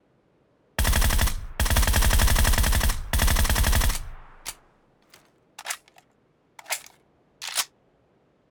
Groza full auto suppressed

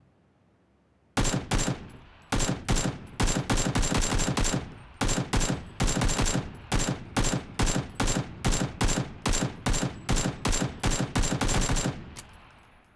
Akm single fire

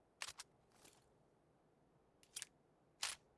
Reload Flare gun

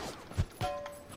Pan headshot

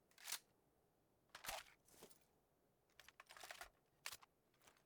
Reloading DP28

